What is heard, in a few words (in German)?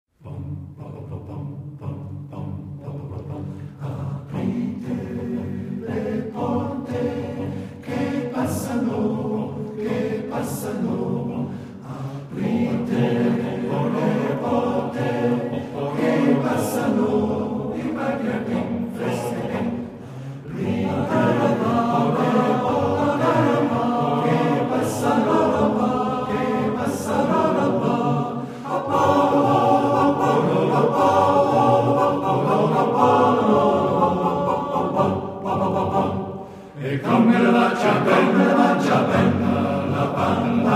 chor; zirbenlandchor